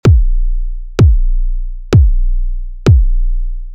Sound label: kick trap